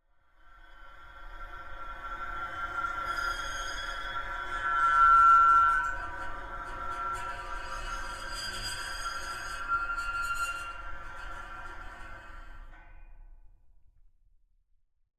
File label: sculpture springs; Sonniss GDC